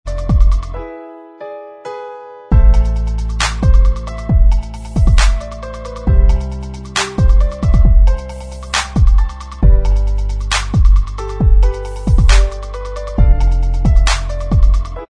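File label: instrumental track, backing tracks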